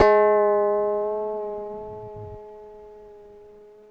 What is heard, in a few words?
ASI KOTO G#2, Yamaha VP-1, ASIA-KOTO; Music Store; 90's Sample CDs